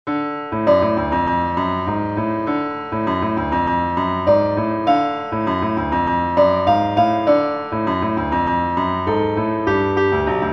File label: Blues Riff; Bankers' Blues Riff Demo